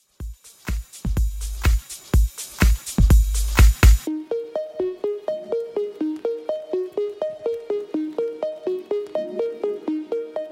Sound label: Tech House Hype Redrum; Remixfreakz